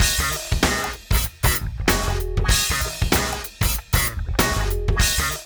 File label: MIX, Funky Ass Loops; East West